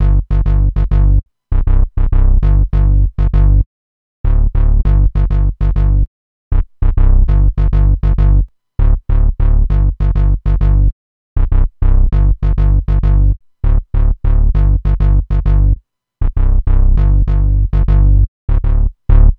E-Coast HipHop Synthbass Gmin; Digidesign; Pro Tools Essential Loops

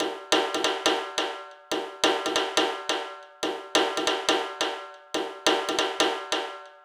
140 House Metal Perc; Dance DJ; Percussion; BellCowbellAgogo; Pro Tools Essential Loops; Digidesign

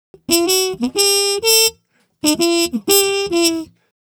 125 NuJazz Trumpet _Emaj; Brass; Pro Tools Essential Loops; Digidesign

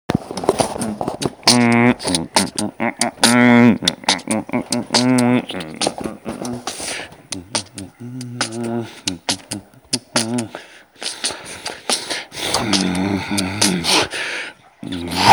Beatboxin

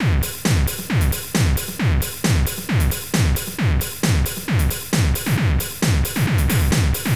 134 Techno Drums; Dance DJ; Pro Tools Essential Loops; Digidesign

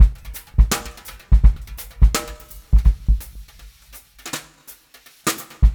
083 Pop Brush Drums; Pop Rock; Moderate Tempo; Pro Tools Essential Loops; Digidesign

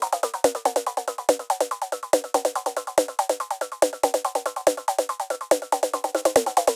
Techno Snare; 142; Dance DJ; Drums; Pro Tools Essential Loops; Digidesign

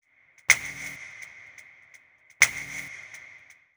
Pop Snaps; Pop Rock; Pro Tools Essential Demos; Digidesign; Essential Loop Demos; Demo Session - Pop Rock

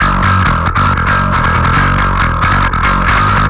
137 Garage Fuzz-Bass Emin; Dance DJ; Digidesign; Pro Tools; Pro Tools Essential Loops

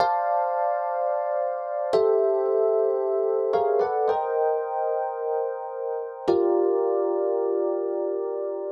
110 Pop MarkII Cmin, E-Piano Clav; Pop Rock; Pro Tools Essential Loops; Digidesign

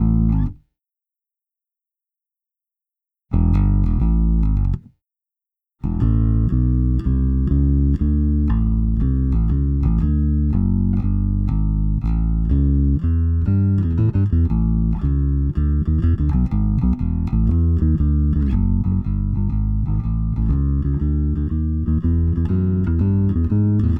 Blues Bass; Digidesign; Pro Tools Essential Loops

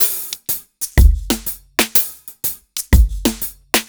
Dance Beat; 123; Dance DJ; Drums; Beats; Pro Tools Essential Loops; Digidesign

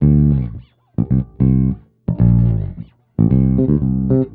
110 Pop 70s Bass Dmin, E-Bass; Pop Rock; Pro Tools Essential Loops; Digidesign

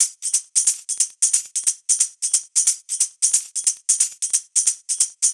090 Hip Hop Tambourine, Percussion; Digidesign; Pro Tools; Pro Tools Essential Loops

